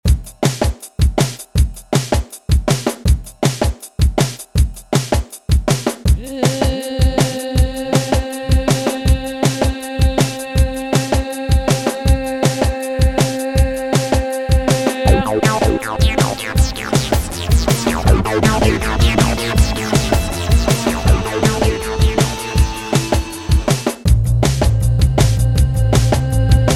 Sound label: With Echo